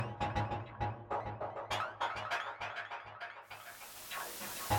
airy texture delayed 100 bpm; synth; instruments; loops

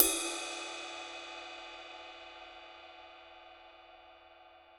crash sample f sharp key

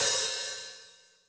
crash G key

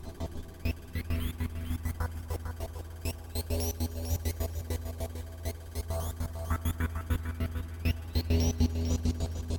clown texture glitched 100 bpm; instruments; loops

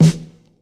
wet steel snare drum E key

